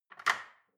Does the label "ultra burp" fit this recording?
No